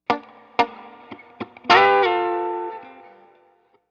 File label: Call And Response Guitar Licks, 70s Analog Funk; Sonic Foundry (Sony Creative Software); What it Is!